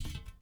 ui pickup metal bars; Rust

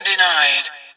door denied

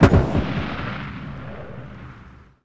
turret cannon 1shot far

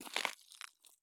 walk gravel; Escape from Tarkov

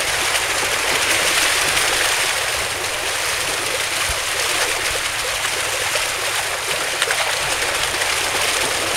Gnd Water Move; DCS

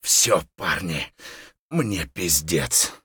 hurt near death, voice; Escape from Tarkov

